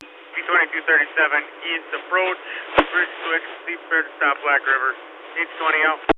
Bridge Switch

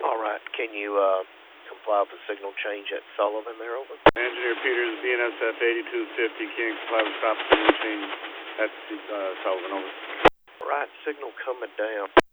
Signal Change; BNSF 8250

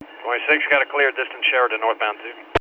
Distant; CSX; Sheridan

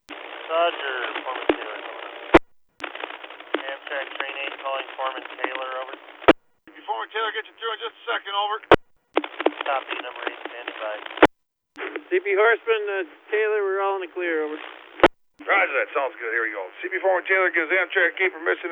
Amtrak

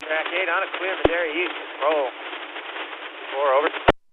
Amtrak

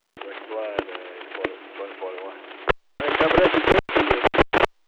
CSX 2041 Brakes Applied

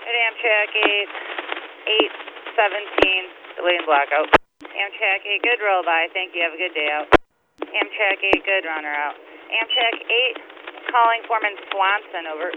Amtrak

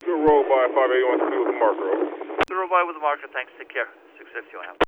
Rollby, CP

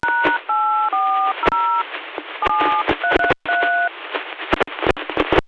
Crossing Tone

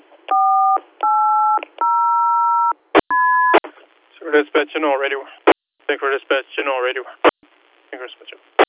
Tone

